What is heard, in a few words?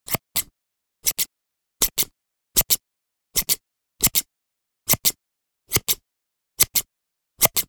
Scissors